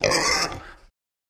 Pig Screech Snort

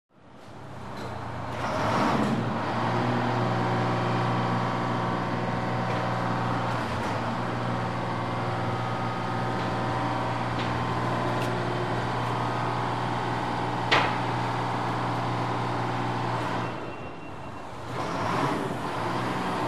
Garbage Truck Close

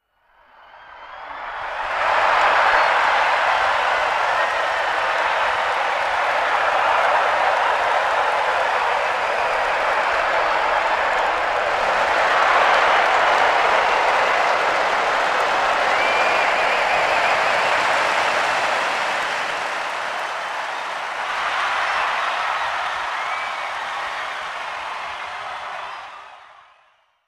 Football Crowd Huge

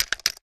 Electric Drill; drills

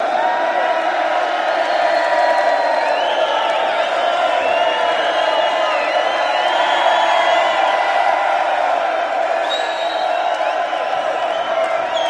Crowd Hurrah Reacts, big arena

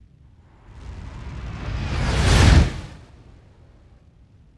Jet Coming Out Warp M, spaceship fx

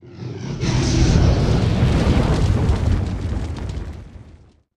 Dragon Roars Breaths

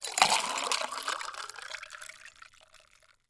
Water is poured into drinking glass.; Pour, Water Liquid, Pour Glass, Water

